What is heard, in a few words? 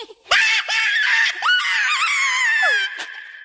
red larva scream